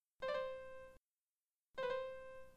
Pralltriller, klavier

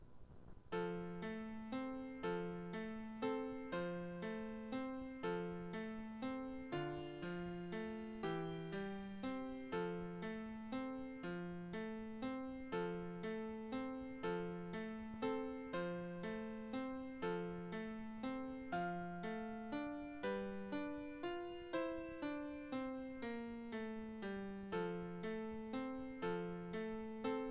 Melodie, klavier

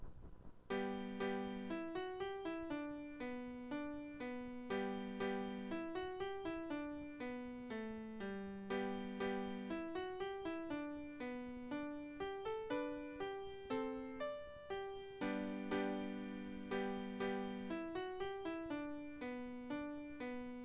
Polka, klavier; John Ryans

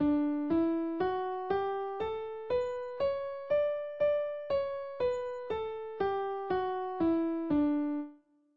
Tonleiter D Dur, klavier

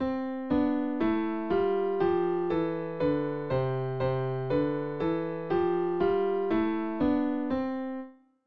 Uebergreifen beide Haende, klavier

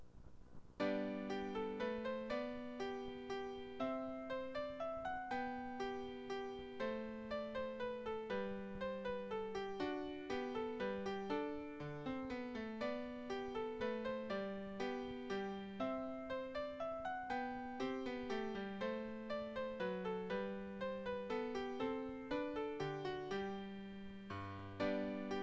Menuett G dur, klavier; BWV. Anh.114

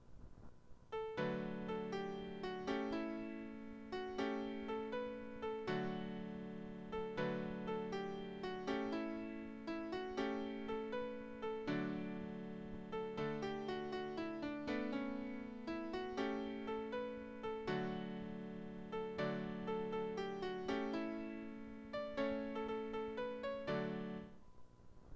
klavier; Mein Hut der hat drei Ecken

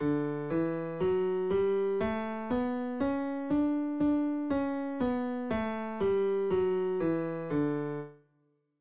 Tonleiter D Dur, klavier

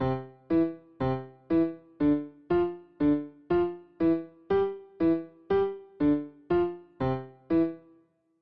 Staccato, klavier

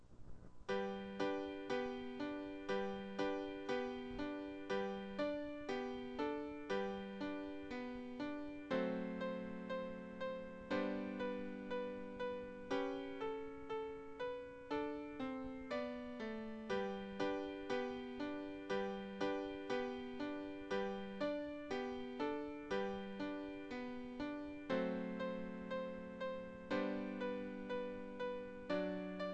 Jingle bells, klavier

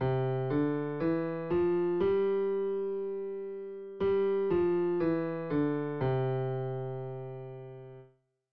linke Hand, klavier

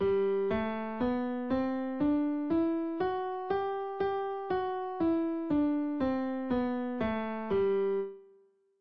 Tonleiter G Dur, klavier

